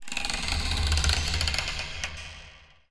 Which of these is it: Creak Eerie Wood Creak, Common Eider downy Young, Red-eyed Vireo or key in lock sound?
Creak Eerie Wood Creak